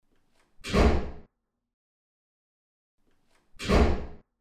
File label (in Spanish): puerta